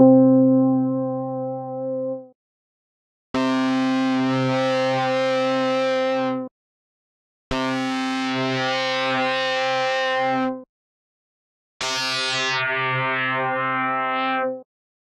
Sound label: Distortion effect